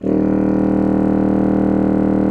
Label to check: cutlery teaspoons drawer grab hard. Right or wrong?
Wrong